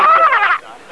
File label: gurgle cry